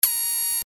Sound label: alarm